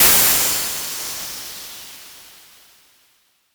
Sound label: Fx 130, Techno; Techno Sounds 2024